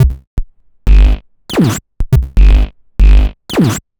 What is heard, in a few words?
Clackers, 120 BPM, Percussion; Sonic Foundry (Sony Creative Software); Underground UK House & Electro